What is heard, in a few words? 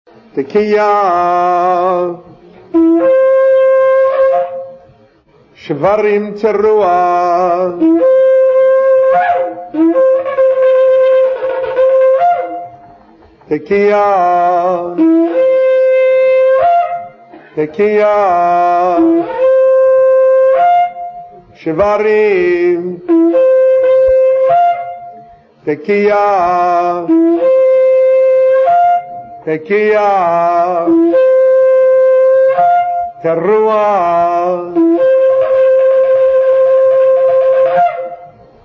Antelope Horn, Shofar